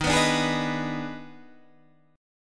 D sharp Major 7th Suspended 4th Sharp 5th D sharp Guitar Standard, chord; strummed; 11,14,12,13,12,11; JGuitar